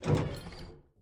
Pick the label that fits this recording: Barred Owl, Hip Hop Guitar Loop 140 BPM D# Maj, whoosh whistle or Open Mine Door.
Open Mine Door